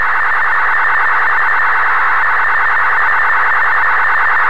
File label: MPT-1327